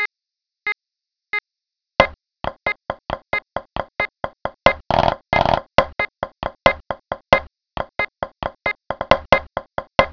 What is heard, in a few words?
Snare, 90bpm